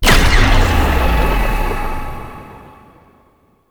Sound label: Apparition Spectral Impact; Warframe; Dagath